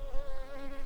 Recording, bumblebees, Sylvarum workers

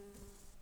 Humilis workers, bumblebees

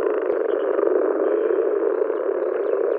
Moor Frog

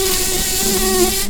Lapidarius queens, bumblebees

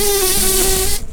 Lapidarius queens, bumblebees

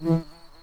bumblebees, Pascuorum queens

